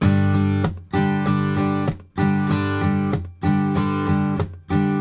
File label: RIFF, Blues Guitar Samples; ILIO; Fretworks